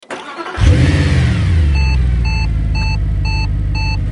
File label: engine start; vehicles; c32; TDMCarpack; TDMCars